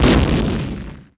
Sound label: penalty